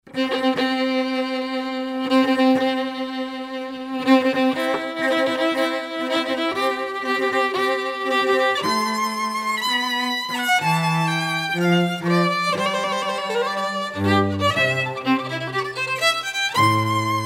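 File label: Wedding March Violin Cello; Mendelssohn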